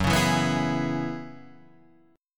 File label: F sharp 7th Suspended 2nd Suspended 4th, Guitar, Standard; F#7sus2sus4; 2,4,2,4,5,4; strummed; capo=2